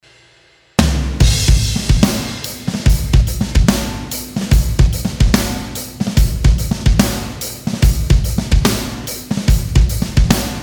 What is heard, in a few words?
drum sample